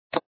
Wall Jump; Tails' Nightmare 2

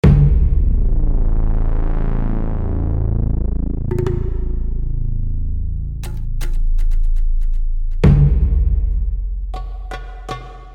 action music ambient 120bpm

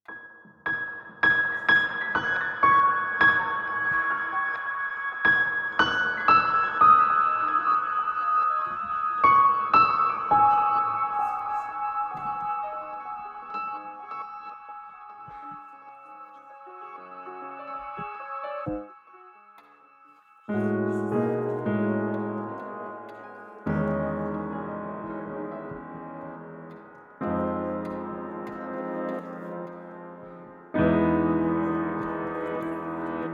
Piano Version; Drag-On Dragoon

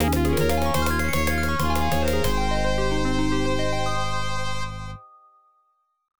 Victory!; comet summoner ibm pc at gamerip 1998

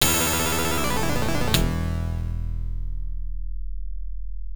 Miss; kozure ookami arcade

